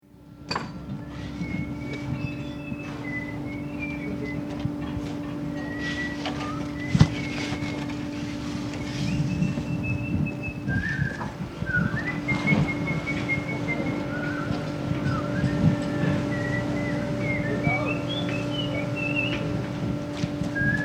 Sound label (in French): sifflement, chantier; qualite sonore espace; Culture sonore